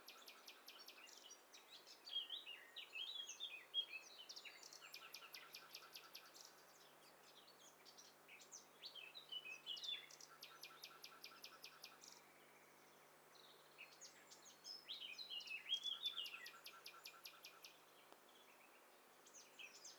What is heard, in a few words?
STEREO BIRD COCKTAIL PARTY